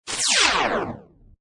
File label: Laser Shot Hit; Scifi Production Elements, Set 1; Science Fiction Sound Effects; Lynne Music